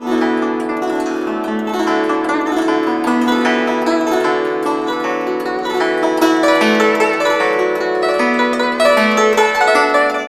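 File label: guzheng; Spectrasonics; Heart of Asia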